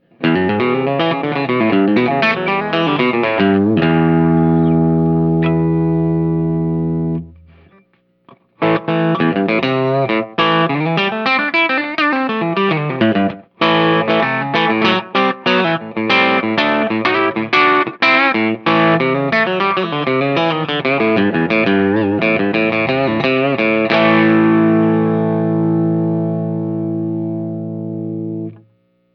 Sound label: country lick bridge pu